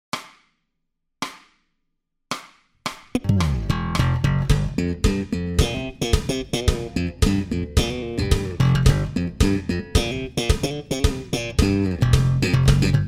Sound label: Slap Theme Fast Base Basso